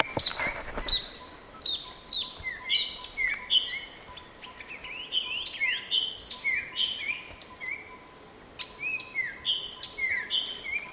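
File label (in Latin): parus funereus, Sikory; sikory ciemnej; ptaki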